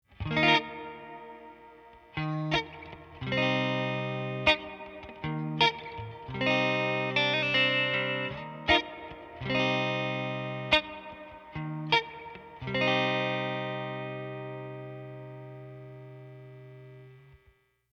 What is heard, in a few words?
hall tele bomblet chords; Strymon Big Sky